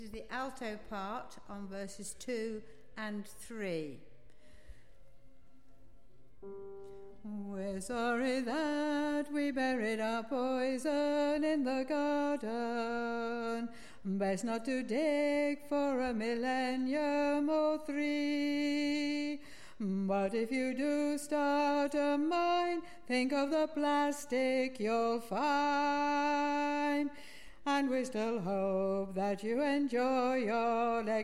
Alto

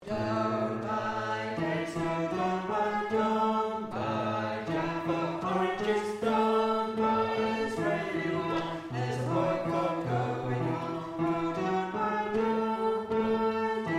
bass, singers

